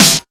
nice snare single hit d sharp key; snare drum samples

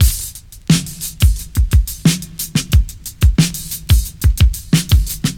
89 bpm drum beat c key

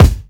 punchy bass drum d sharp key; Royality free steel kick drum sound tuned to the D# note

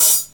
shiny open hat sound f sharp key

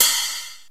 small reverb crash cymbal sound g sharp key

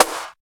clear acoustic snare b key

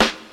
Original Acoustic Snare D Key; snare drum samples

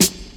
trebly snare b key, tuned drums b key

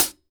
crunchy high hat D key

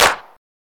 wet hand clap sound g key; single hits one shots

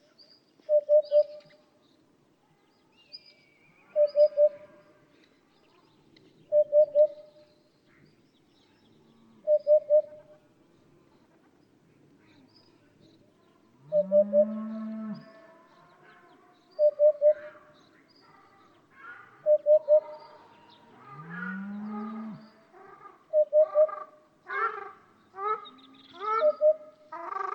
hoopoe, ruddy shelduck, cows; soundscapes

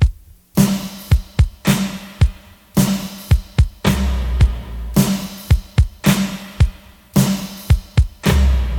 109 bpm 80s punk drum loop sample c sharp key

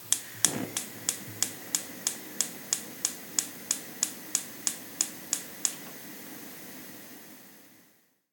starting the cooking machine

